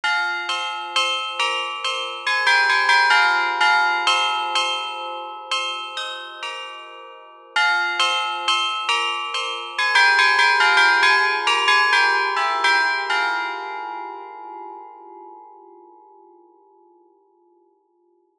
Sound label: Carillion